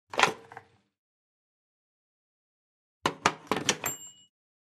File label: Telephone Receiver, clicks